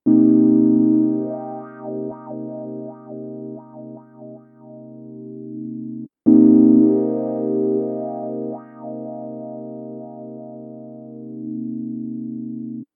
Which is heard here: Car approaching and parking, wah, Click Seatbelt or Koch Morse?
wah